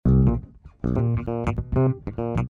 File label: Funk